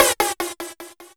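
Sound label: rim processed, rims, fresh disco house; USB Soundscan vol.20; AKAI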